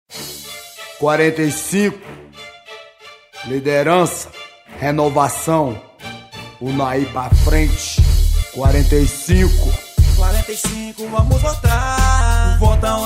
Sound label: rap